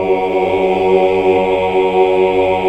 OOH, Hallelujah

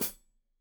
Hat Foot, Hi-Hat, Cymbals Stereo

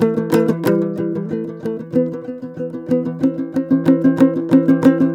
TOPSHUR; Soundscan vol.60; Asian Traditions; AKAI

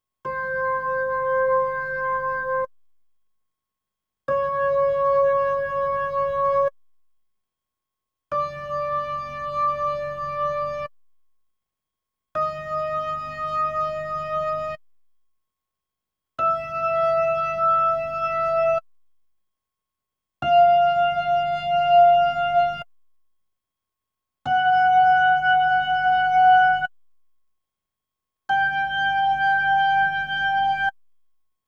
B3 Organ; Masterbits CLIMAX 8

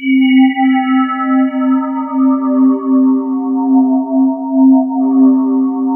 BELL STEE, ATMOSPHERES; Sound Gallery - Charged

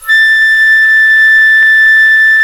flute, C flute

